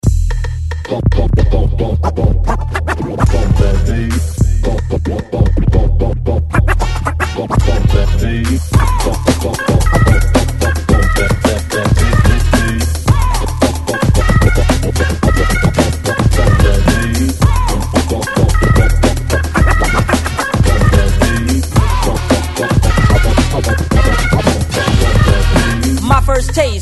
Miami Bass

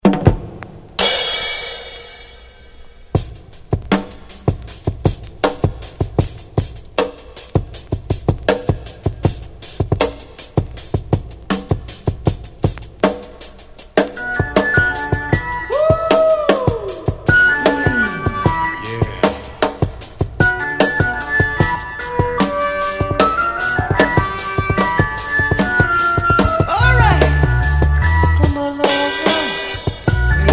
Cymbals Funk

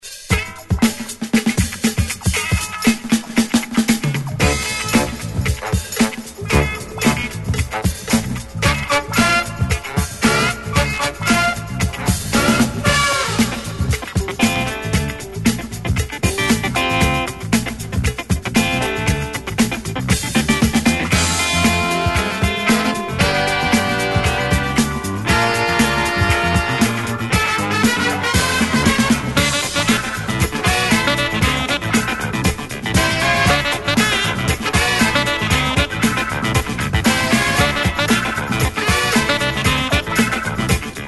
Disco Scratchin

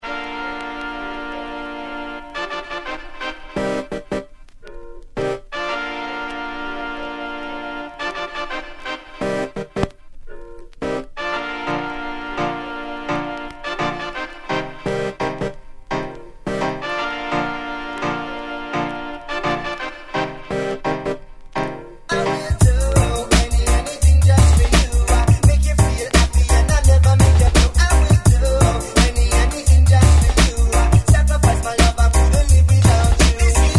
REGGAE Dancehall Remix

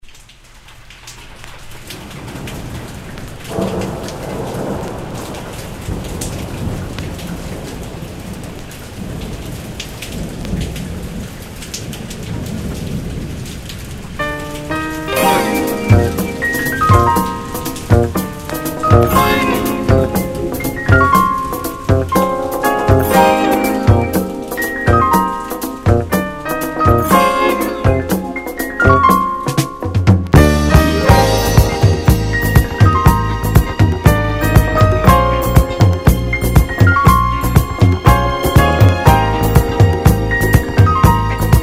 DISCO Strings; Salsoul

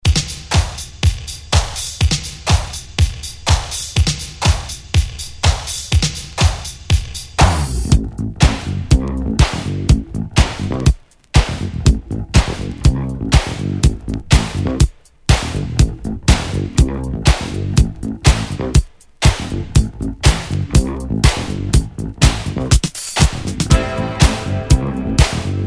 DISCO

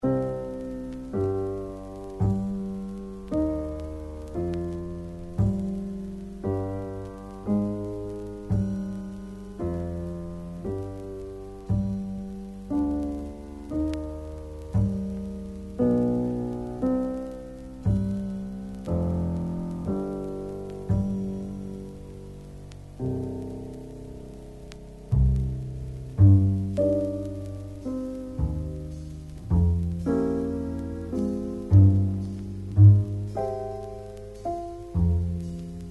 JAZZ Trio, Passacaglia In C Minor

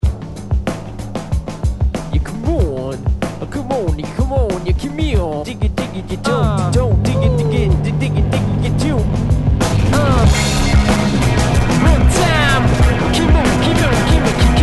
Drums

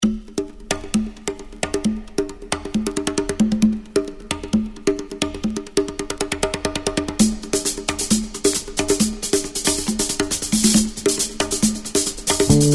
DISCO

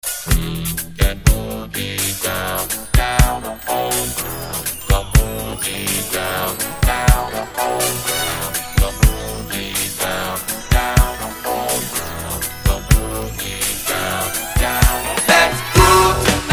DISCO

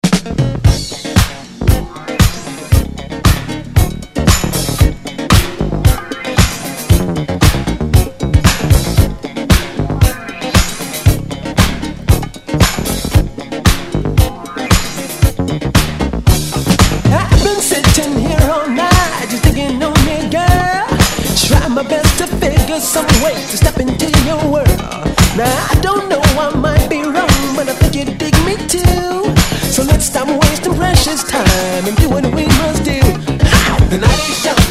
Disco

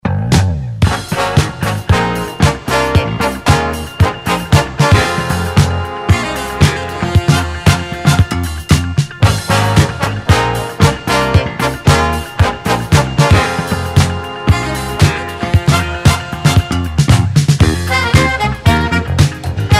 JAZZ Orchestra

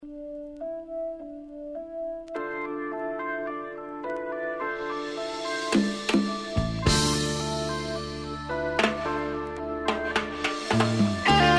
Rock Beat; New Mood Music

